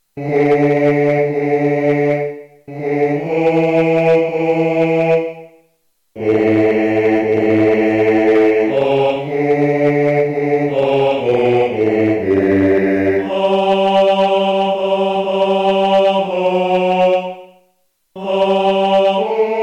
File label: basses, chorale; Lascia ch'io pianga (voix synthétique)